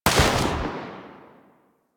Pistol Shot A; Sybaris

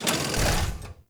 Mech Step Arm Down; Nechro Mech; Vehicles

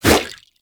Sword Swipe, Whooshes

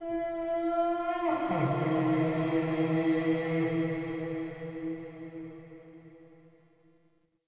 Cave Mysterious Distant, ambience; Midrath Island; Shrine Cave

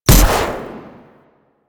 Electro Mag Trigger Explode; Warframe; Corpus

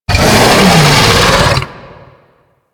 Infested Bone Blade Vocal Soft C; Warframe

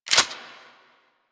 Shotgun Pump Mech; Crp Shotgun

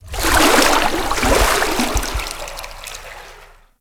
Ocean Water Rising Laps; Warframe; Grineer Ocean

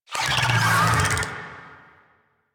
Infested Big Ashen Vocal Attack Death; Warframe

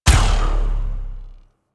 Rail Jack Weapon Two Shot; Modular Weapons

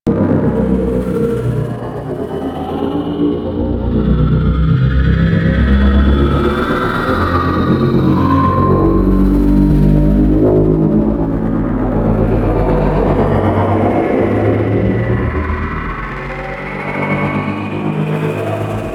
Rotation Loop, Vehicles; Warframe; DE; RailJack; RailJackShip; AlternateEngines; Zekti

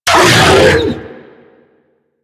Infested Juggernaut Vocal Pain; Warframe

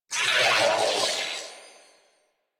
Death Grineer Male; New War; Narmer

